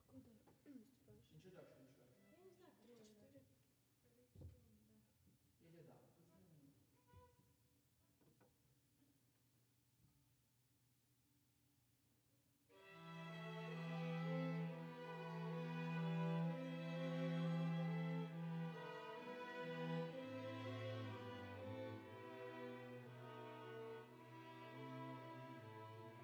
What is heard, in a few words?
Piano